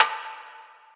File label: Perc